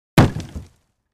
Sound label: Large Object Hit Sled